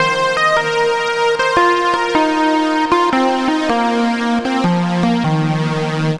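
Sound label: Lead Oriental, Drum & Bass Keys; Sonic Foundry (Sony Creative Software); Knutrix Drum & Bass; Keys Pads 1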